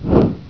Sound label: swoop